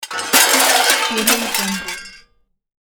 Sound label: junk thrown onto junk pile